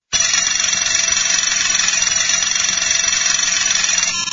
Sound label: toy alarm